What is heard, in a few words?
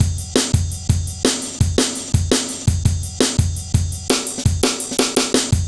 heavy live ride, drum loops